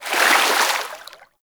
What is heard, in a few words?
Hoverboard Terrain Caster Fluid; Warframe; Vehicles; Venus